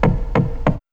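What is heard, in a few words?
bass effect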